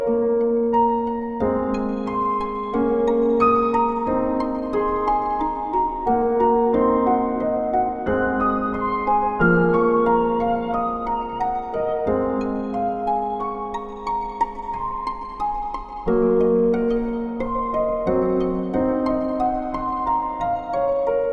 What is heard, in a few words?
Song Loop